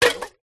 household watering can small metal full of water set down on hard ground; with water splash